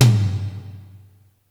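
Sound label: pop toms, drum kit; Giga Samples Collection; ELQ-DrumQit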